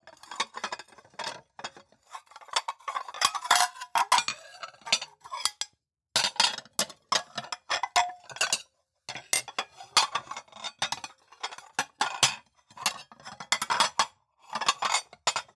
Plates Being Arranged

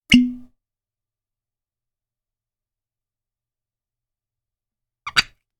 Bottle Open

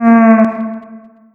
Lonely Elephant Alien Thing

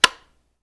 wall switch on

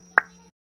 Message Sound

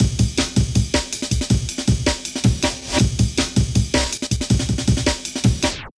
Amen Break A 160 Bpm

